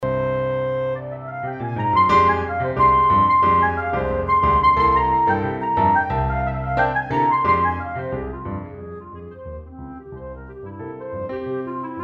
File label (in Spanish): Piano y Clarinete